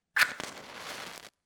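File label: match sound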